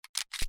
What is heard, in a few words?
hg mag in